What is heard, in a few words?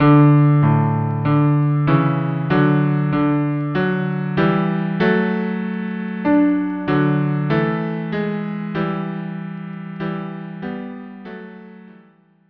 cello duo; ave verum corpus